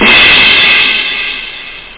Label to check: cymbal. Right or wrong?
Right